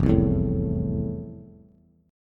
F Major 7th F, Bass; strummed; JGuitar

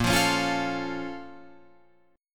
Bb Minor Major 7th, Guitar, Open F; BbmM7; strummed; 10,12,13,12,12,12; JGuitar

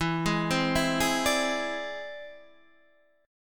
E Augmented 7th E down Guitar Standard; E+7 arpeggiated; JGuitar

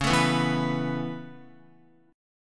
Db Minor 7th Sharp 5th Db down Guitar; strummed; 4E,4A,5D,5G,5C,6F

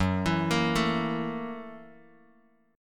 Gb 7th Sharp 9th Gb Bass Standard; arpeggiated; Gb7#9; 14,19,20,14; JGuitar

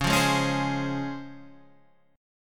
C sharp Major 7th C sharp, Guitar Standard; C#M7 strummed; 9,8,6,6,9,8; JGuitar

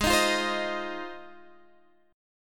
Ab 6th Ab, Guitar, Standard; strummed; JGuitar

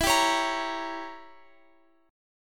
E Diminished 7th, down Guitar Open F; Edim7; strummed

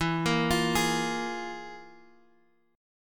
F Minor Major 7th Double Flat 5th

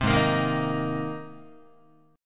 Ab Major Add 9th Ab Guitar; Abadd9 strummed; JGuitar

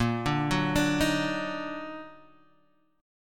D sharp Minor Major 7th A sharp

